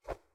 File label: cloth pull; soundscrate